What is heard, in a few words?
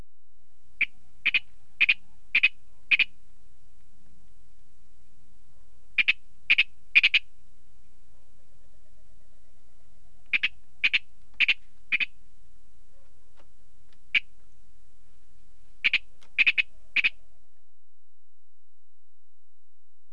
virginia rail